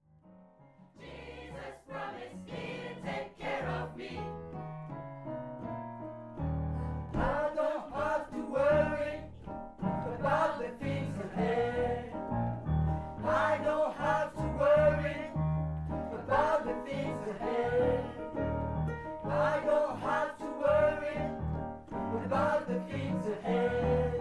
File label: mezzo; Part 3; gospel